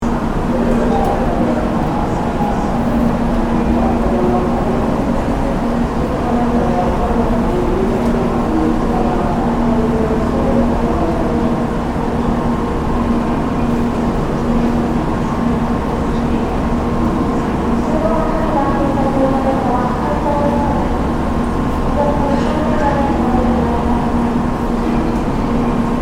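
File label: bullet trains station; transfer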